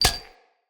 Dendrit Staff Caster Foley Grab; Weapons; Staves

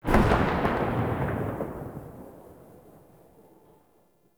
Concrete Archgun Charged Tail; Warframe; Archwing

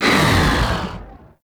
Beam Warning Beam End; Enemies; Abilities; Ethereal; Warframe; Zariman; Void Angel

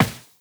Step Default, Footsteps Walk; Warframe; Characters; Tenno

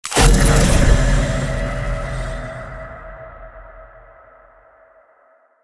Sentient Mimic First Reveal Stinger; Warframe; Sacrifice; Chamber

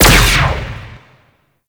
Hazard Laser Beam Start; Gameplay; Stadium; Warframe; Nineteen Ninety Nine

